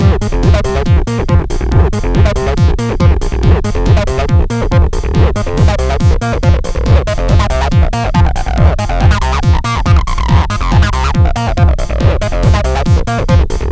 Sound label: Acida House; 100 acid vol 1